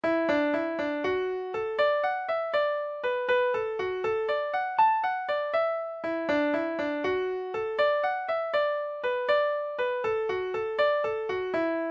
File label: jig; carraroe; irish